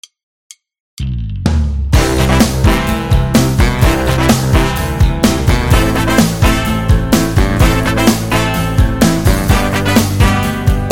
Late Night Show, jazz club

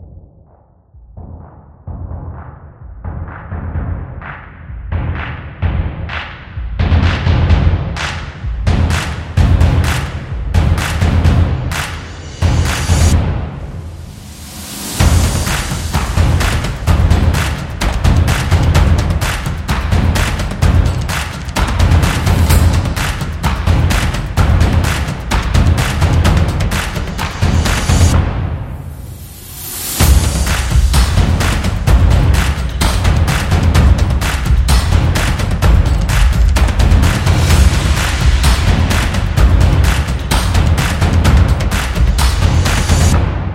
Percussion Claps

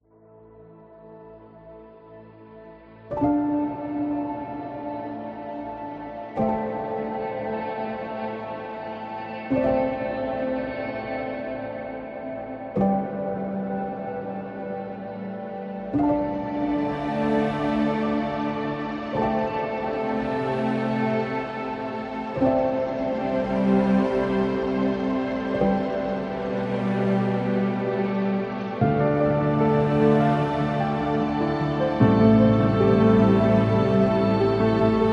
Sad Documentary

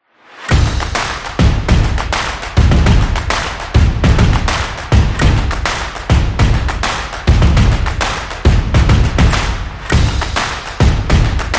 This Is Drums Action

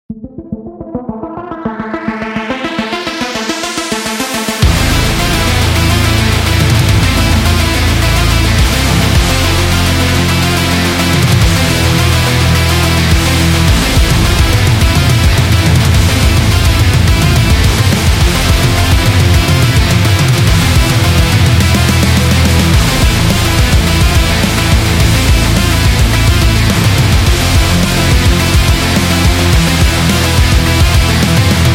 Action Sport Metal; sport rock

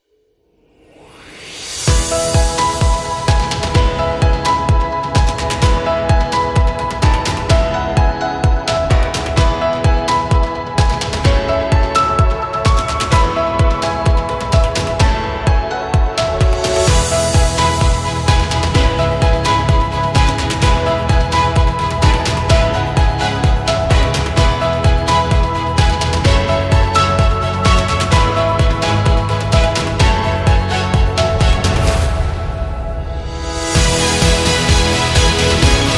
Dynamic Epic

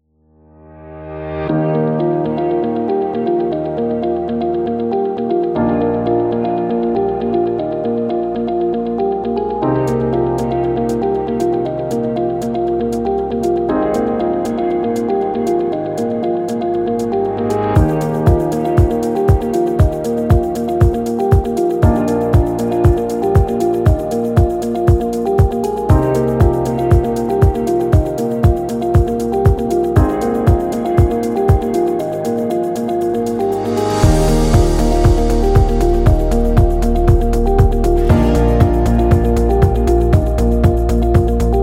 Corporate Beat

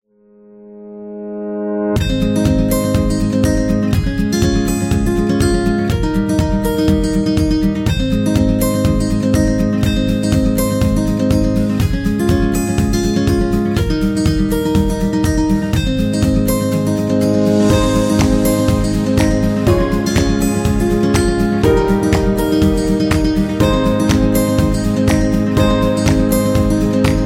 Upbeat Guitar Acoustic; happy music vol 7

